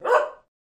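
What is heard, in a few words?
Terrier Dog Bark Indoors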